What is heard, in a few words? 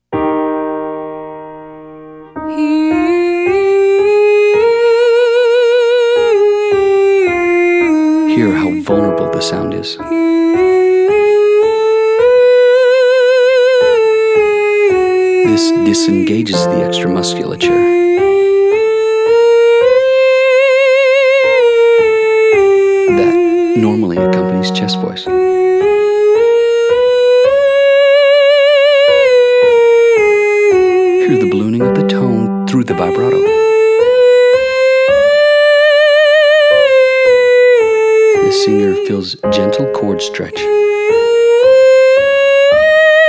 Umlaut female; Singing